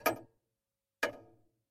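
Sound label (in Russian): короткий громкий щелчок старых часов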